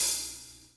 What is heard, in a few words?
HATS SEMI, Drum Kits